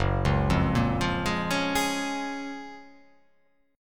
F sharp Major 9th

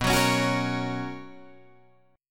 B Major 7th Suspended 2nd B Guitar Drop D

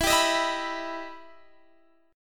E Minor Major 7th E down Guitar Drop D

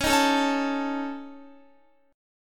Db Suspended 2nd Sharp 5th Db Guitar; strummed; Dbsus2#5; JGuitar

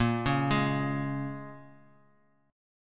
A sharp Minor 7th A sharp, Bass Standard; arpeggiated; x,13,11,13; JGuitar

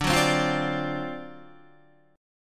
D 7th Sharp 9th D down Guitar; D7#9; strummed; JGuitar

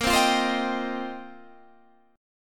F 7th Suspended 2nd Suspended 4th

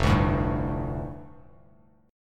F 6th Add 9th F down Bass Standard, chord; F6add9 strummed

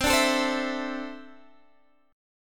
D 7th Suspended 2nd, chord

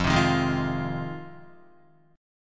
F Minor 7th F Guitar; strummed; JGuitar

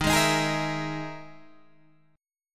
E-Major Sharp 11th Eb

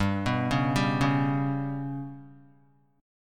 G Major Flat 5th, down, Guitar; GMb5 arpeggiated; JGuitar

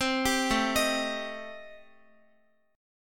A-Minor 7th Flat 5th, Guitar, chord; Am7b5; arpeggiated; x,x,10,12,9,10

